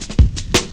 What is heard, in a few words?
Drum Loops, Jungle; Zero-G Jungle Warfare 1, 2 & 3